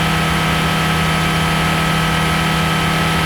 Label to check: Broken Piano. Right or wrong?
Wrong